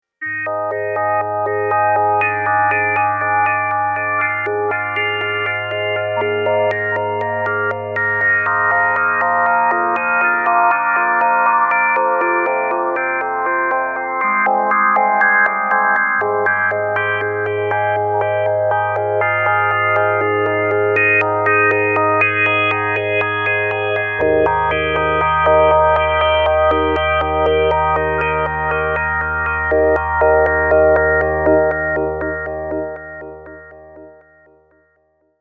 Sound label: gore sequence